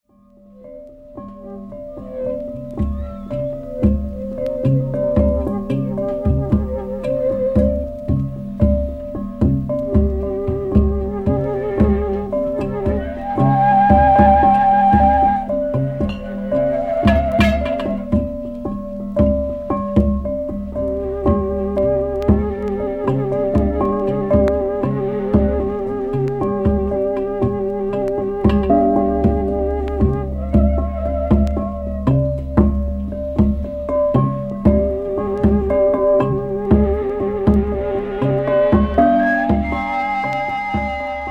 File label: glass orchestra